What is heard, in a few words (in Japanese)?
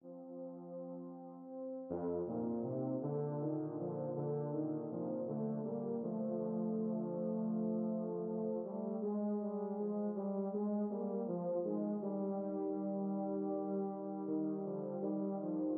ユーフォニアム